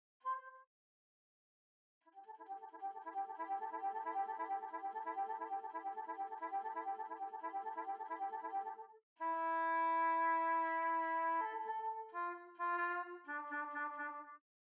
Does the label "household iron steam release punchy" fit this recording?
No